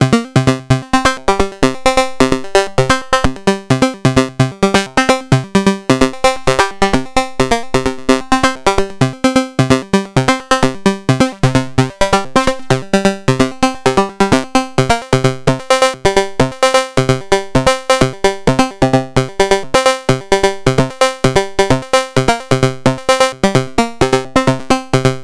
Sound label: Trident Funky Trident; Rossum Electro-Music